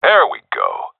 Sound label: cheer, seal diver; vo; agents; Source Model Packs; Counter Strike Model Pack; CS2